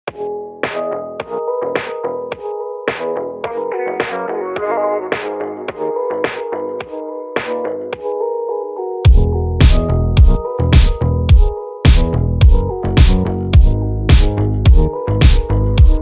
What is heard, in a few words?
Instrumental Ringtone